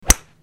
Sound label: hit